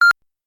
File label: single Beep; Zomboid 41.78.16; Project Zomboid